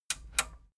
metal button radio click disable slow

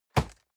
box hit hard dry

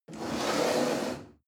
chair wood slide on floor medium

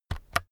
keyboard external key press slow

